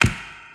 boom steel snare drum sample a sharp key, acoustic snare sound tuned to the A#